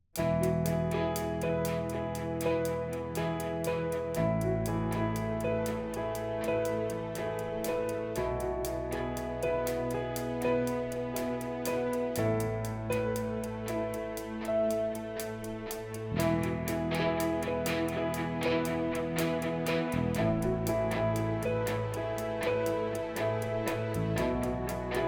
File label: Interlude upbeat